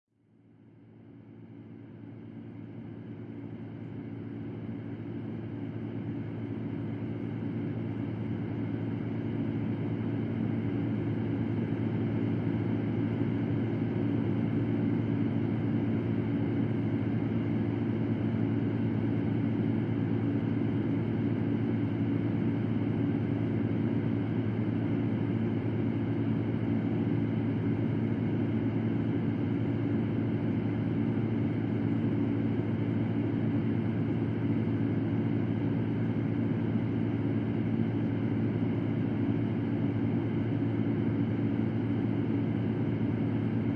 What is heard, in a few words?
white noise; sleep well